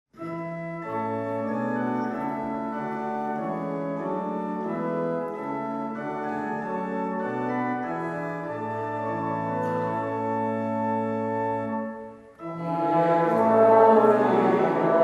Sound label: Trombone; Eert God